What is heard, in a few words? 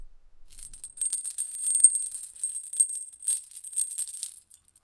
light coin jingle soft; fruit